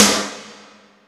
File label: SNARE, M1-M3R; M1-M3R KITS; Greytsounds VOL-1